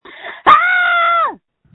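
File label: Scream, phone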